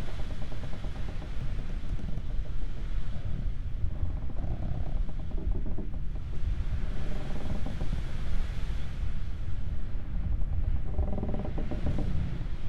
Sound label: interior, ambiance